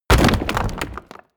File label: break stone small